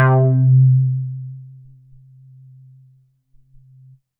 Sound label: C3, Synth Line; 90 s Sample CDs; NiceBeats; SynthLine VOL-18